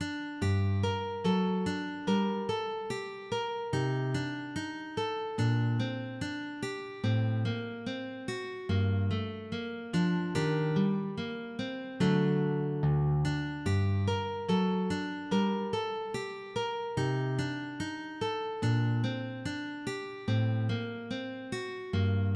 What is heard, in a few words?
Gavotta